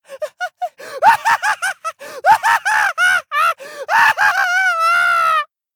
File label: Mad Laughing